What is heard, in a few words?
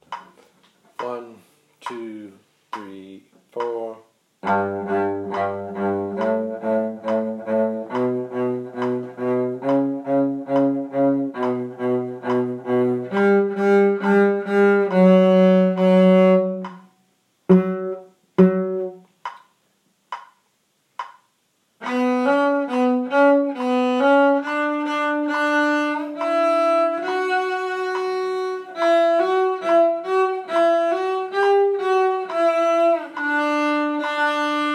viola